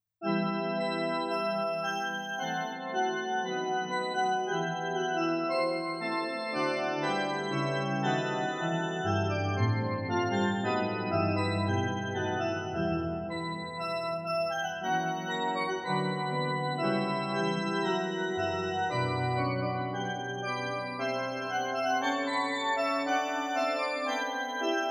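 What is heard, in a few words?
A minor adagio, piano; ivory; bach; altiverb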